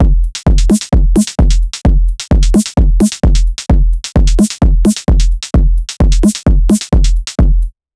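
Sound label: only groovy kick and snare, 130bpm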